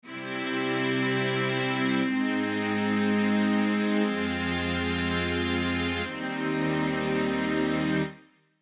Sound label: common chords